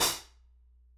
Soft Xtra Dark, Hats; Airwindows

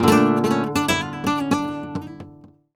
Remates De Pulgar, WAV Guitar, Flamenco

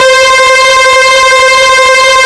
HEAVY C4, LEAD SYNTH; Best Service Alpha Dance vol.2

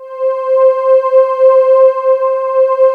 pulse pad C5; INPULSE

